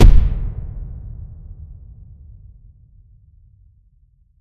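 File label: heavy impact confirmation; solid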